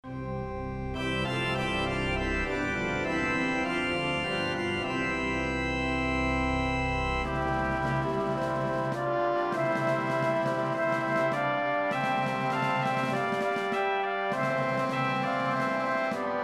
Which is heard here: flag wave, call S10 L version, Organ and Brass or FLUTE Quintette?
Organ and Brass